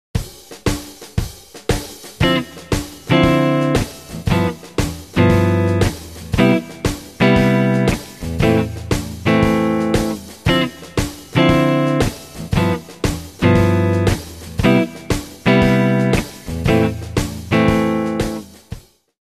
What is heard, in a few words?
Rhythm Guitar